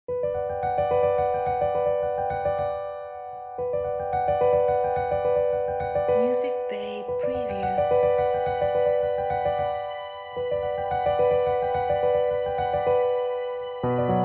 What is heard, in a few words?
Dramatic Piano